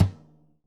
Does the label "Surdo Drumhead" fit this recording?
Yes